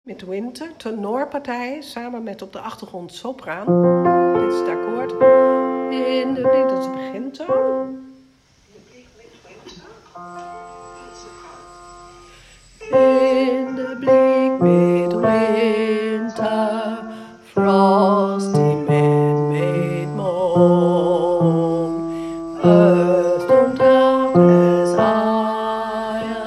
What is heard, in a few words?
tenor